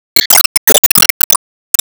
Enemy Majin Active Charge Loop

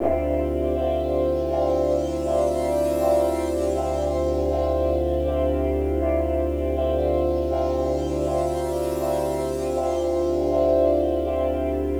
Pad D; Nebulacloud

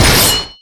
Sword Pullout; Enemy Temple; disney star smash; android

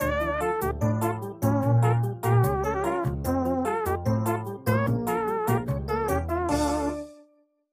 Elevator Jingle; Lethal Company

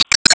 Boomerang Hit

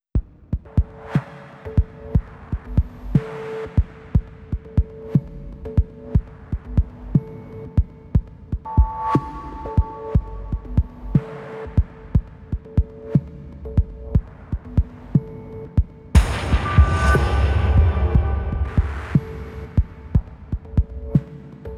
Drums 120

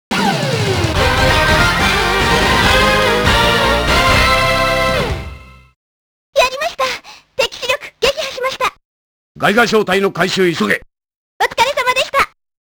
Mission Clear

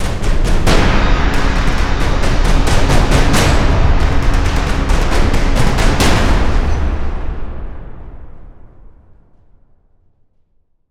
Stinger Fill; extinction deluxe edition; ps4 windows xbox one

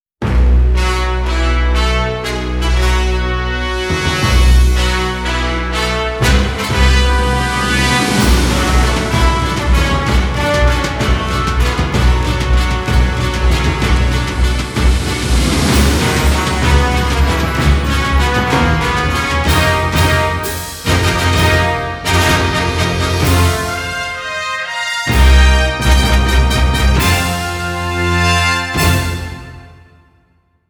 Fanfare Honolulu

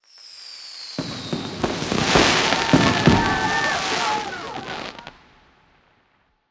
Fireworks; Trial Complete; subway surfers city sound effects; Android, iOS

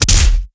Enemy Take Hit

